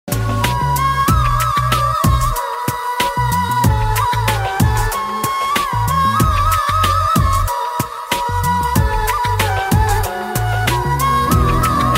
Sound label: instrumental ringtone flute ringtone